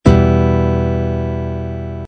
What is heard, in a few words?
e minor, guitar